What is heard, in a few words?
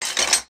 tools, wood metal; wood metal SFX